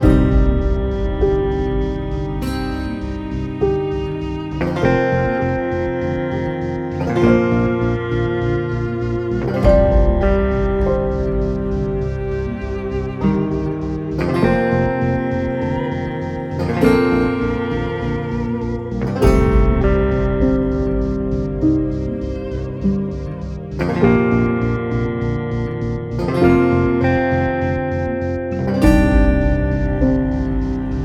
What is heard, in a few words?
Tension Loop 100bpm 16bar